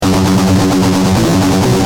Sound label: G Jam, Guitar; Industrial